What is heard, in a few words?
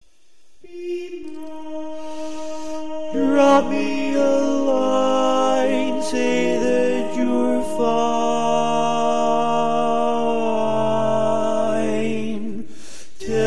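Baritone, barbershop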